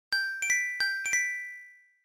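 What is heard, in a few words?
iPhone 5s Plus Notification Ringtone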